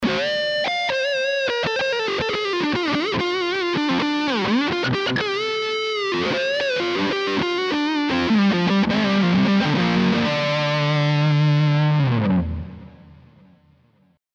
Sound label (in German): Les Paul Modern Hals Pickup Lead